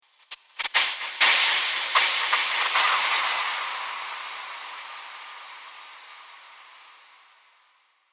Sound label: Fizz Bounce Laser Hiss; Sci-Fi; Andrew Fleming